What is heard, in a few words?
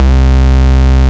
Ultimate Bass Root C; Vengeance Samples